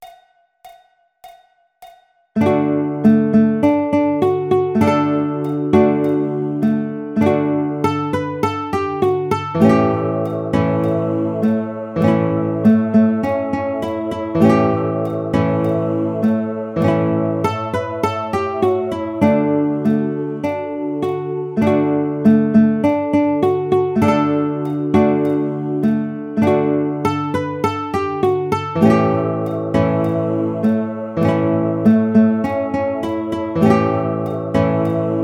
La Cucaracha Guitar

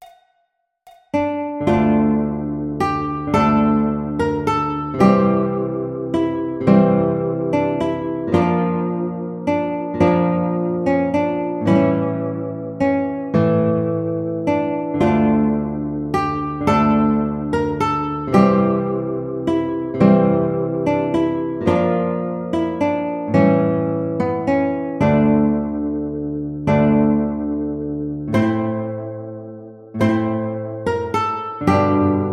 Alto, alto guitar; Greensleeves

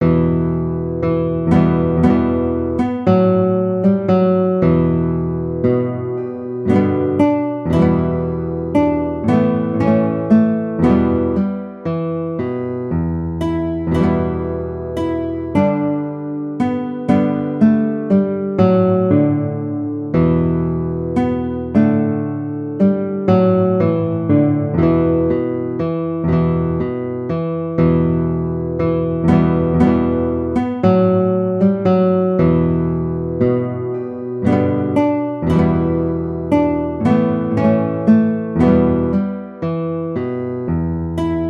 Scarborough Fair Guitar

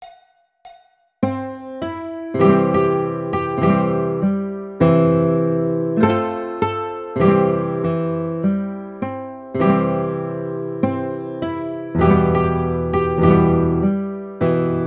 Kumbaya Guitar

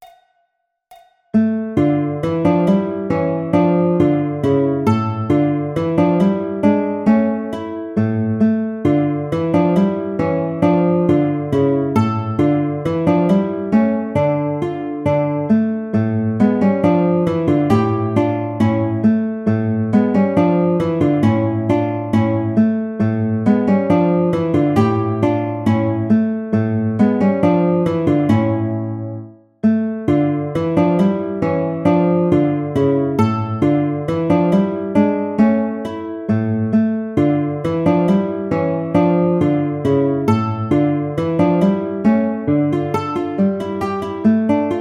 Andantino Grazioso Guitar, classical; Carulli Op 241 No 39

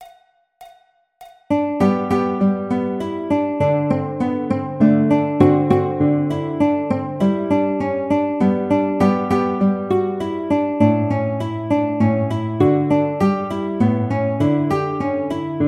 Polonaise Guitar; Carulli Op 241 No 26; classical

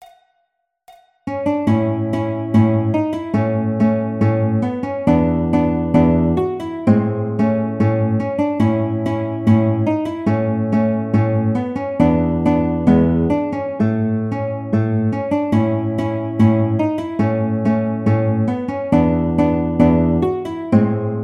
Allegretto Grazioso Guitar, classical; Carulli Op 241 No 29

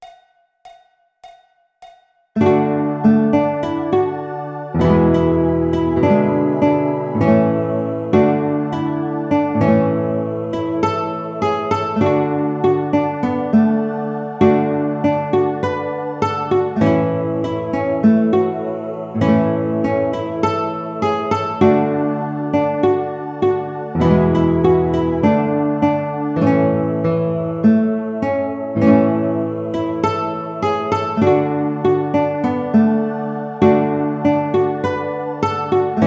Guitar; holidays

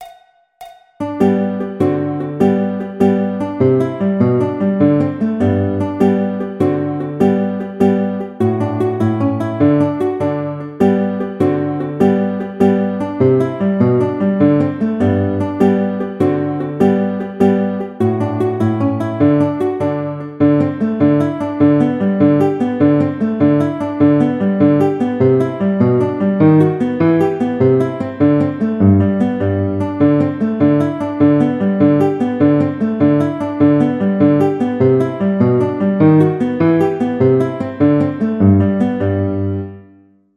Poco Allegretto Guitar, classical; Carulli Op 241 No 06

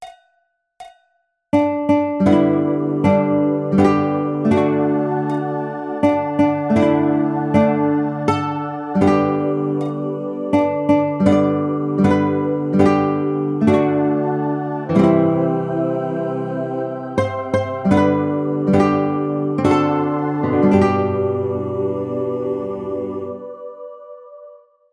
Happy Birthday Guitar

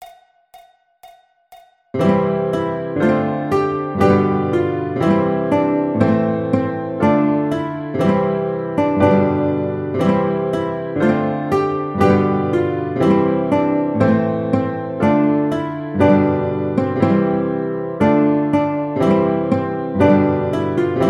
Ode To Joy Guitar

